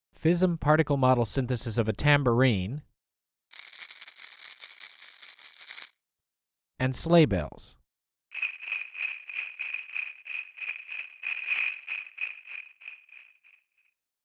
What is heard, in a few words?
Tambourine And Sleighbell